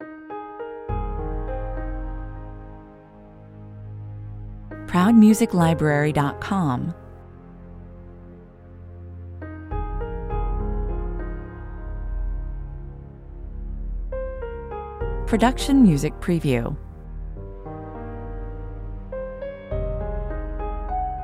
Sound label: A simple, heartfelt piano instrumental that is ideal for evoking a tender, romantic ambie, 51 bpm; With a gentle lyrical melody and a sparse, spacious u